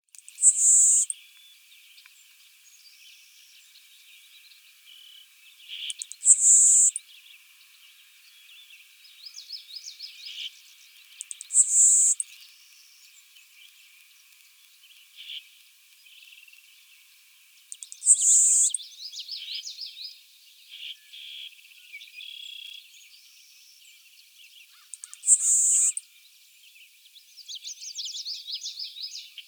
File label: LeContes Sparrow 8 songs